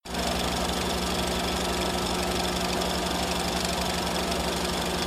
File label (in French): son hover bt langue